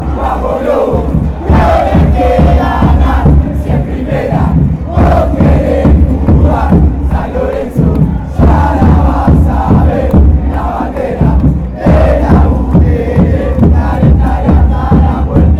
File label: huracan chant